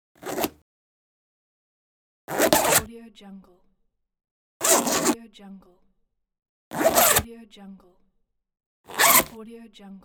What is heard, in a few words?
zipper; welltone